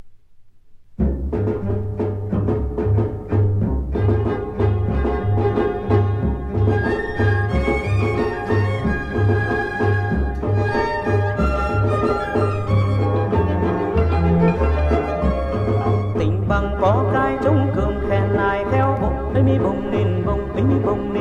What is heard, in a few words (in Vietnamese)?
TIENG HAT VIET NAM